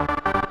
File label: synth